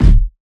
bassy kick one shot d key

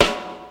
steel snare drum sample f sharp key

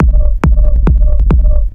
techno hard minimal kick; drum loops

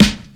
2000s clean hip hop snare drum g sharp key

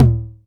low mid tr 909 analog tom a key; tom drum

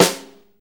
small reverb acoustic snare f sharp key

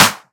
short clap sound clip g key; single hits one shots

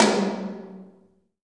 short reverb tom drum one shot g key

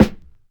snare drum sample c sharp key

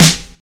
dirty snare sound e key

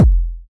kick drum one shot f key

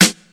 juicy snare drum f sharp key

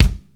sharp kick drum sound G key

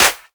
hand clap sound b key; percussion single hits one shots

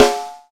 tight lows snare one shot f key; snare drum samples

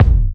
sharp kickdrum sample a key; kick drum samples

